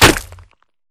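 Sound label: Bullet Light Ballistic Concrete impact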